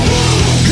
boom button

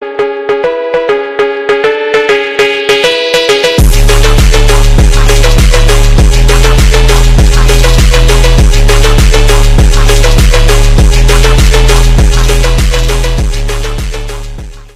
phonk chicken

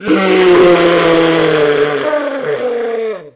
golden lion jumpscare sound; nights at zooplace 2

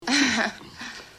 ah heh

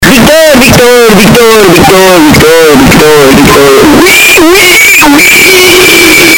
bass boosted

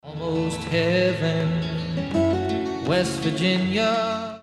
guitar

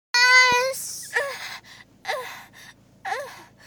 yeysss

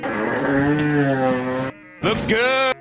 looks good fart

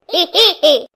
heeheehee; tattletail

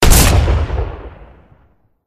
drum shot

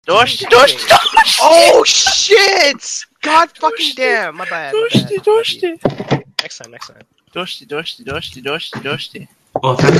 hahaha

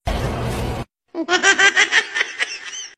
venom luaghfing

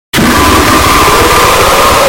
grrrrrr doors

